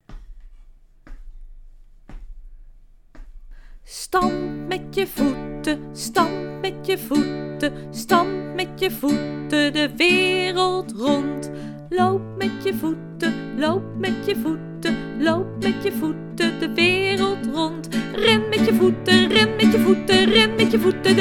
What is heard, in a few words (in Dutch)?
zang gitaar